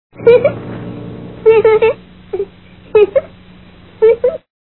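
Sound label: girl laugh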